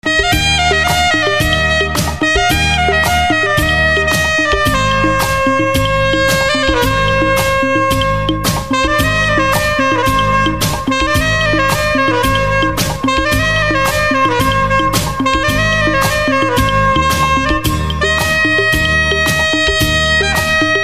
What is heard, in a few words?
Saxophone Instrumental Ringtone